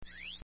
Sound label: maximize